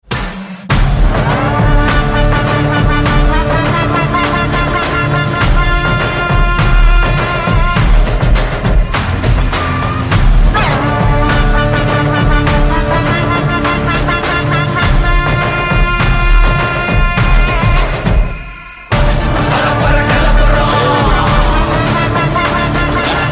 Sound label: bgm; jailer